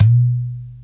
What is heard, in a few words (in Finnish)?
Turkkilasirumpu Boing